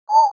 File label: crossbills alarm